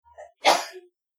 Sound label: Single Sneeze Female; Sneezing Sound Effects